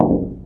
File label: glass sheet step; Source Engine; video game; physics